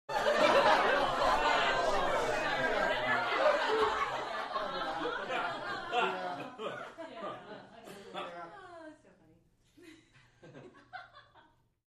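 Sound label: Crowd 12 Boisterous, small interior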